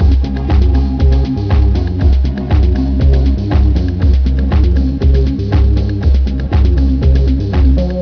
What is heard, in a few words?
pulsewidth